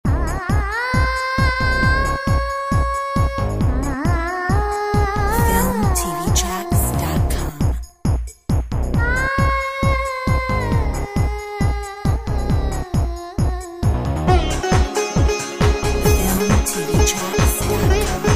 Bollywood Dance

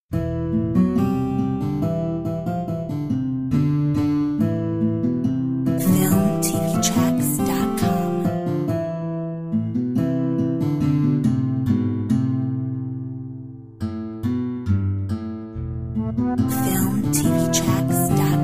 Medieval guitar

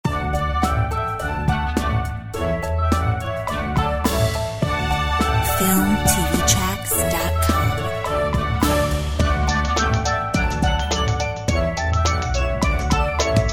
Polka

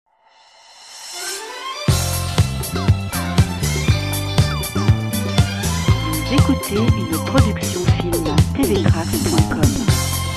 Disco Star

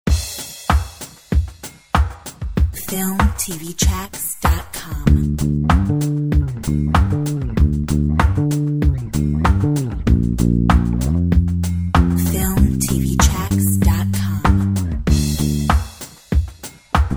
Drum bass; Filmtv-tracks

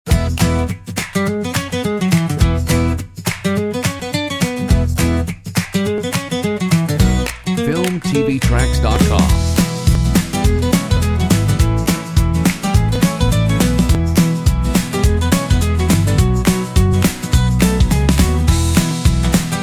POP ROCK PARTY; comedy funny

